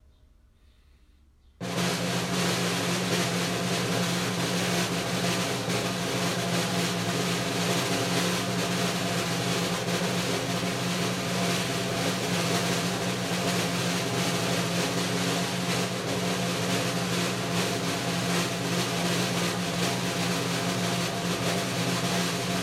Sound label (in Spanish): Roll De Tambor